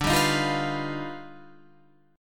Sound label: Eb Augmented Major 9th D